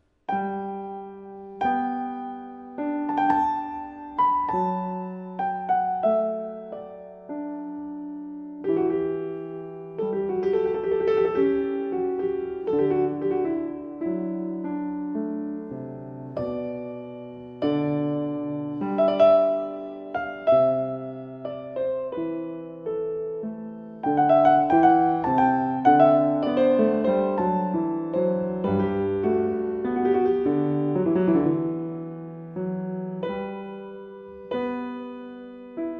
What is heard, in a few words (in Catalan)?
Piano Sol; Variacions Goldberg